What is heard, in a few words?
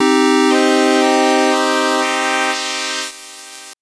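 Synth Pulse minor c3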